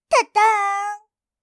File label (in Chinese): Windows開始